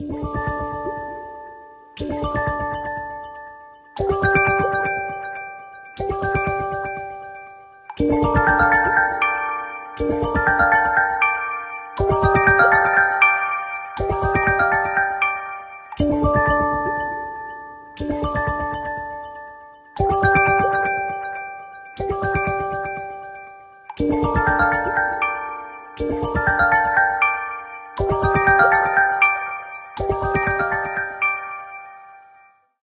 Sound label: Water Song, ringtones; MagicOS 7.1